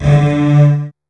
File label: DOUBLE BASS; ILIO; Kirk Hunter Virtuoso Strings VOL-2; 90 s Sample CDs; 5DOUBLEBASS